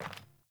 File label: Sandals Walk Metal, Footsteps; Player